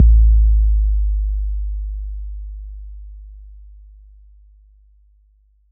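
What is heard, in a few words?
bass drum 808 Decay F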